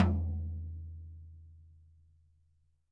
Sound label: GTom, E-MU PX-7